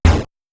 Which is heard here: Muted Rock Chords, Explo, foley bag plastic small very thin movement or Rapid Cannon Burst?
Rapid Cannon Burst